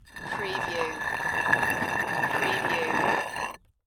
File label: Stone Drag - Rock Grind - Long